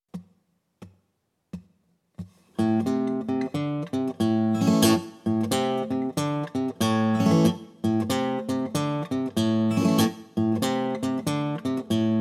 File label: Open Tunings Blues Rock Guitar; An Easy Guide To Open Tunings For Blues Rock Guitar